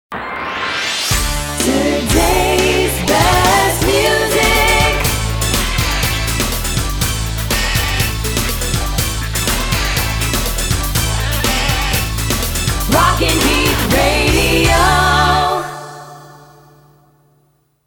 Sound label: sung jingle; Todays Best Music Rockin Heath Radio